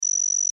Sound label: Science Fiction Beeps Chime; Tech